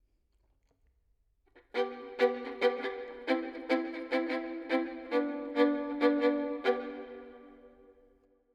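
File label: harmonium 3-4 70 verb; Melody; Violin; G; Sample Banks; 4-4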